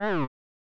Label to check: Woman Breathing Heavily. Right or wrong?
Wrong